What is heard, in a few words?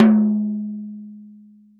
Toms